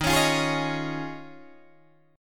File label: D sharp 9th Suspended 4th D sharp, Guitar DADGAD